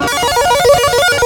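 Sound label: sequence lead, distortion guitar; East West - Global Sounds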